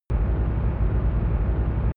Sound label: spaceship engine idle noise; raumschiff